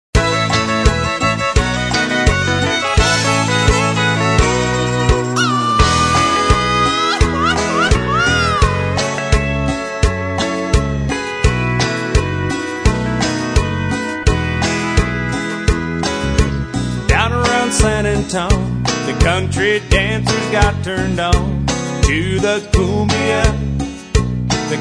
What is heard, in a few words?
Cowboy Cumbia